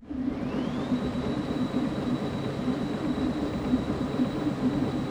P-51D Cockpit Fuel Pump; DCS

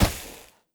sand; Rust

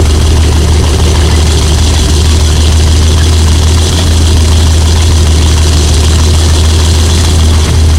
panzer3 engine rpm 33 load; engines; War Thunder

